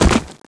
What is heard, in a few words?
land; SINDEMO; impact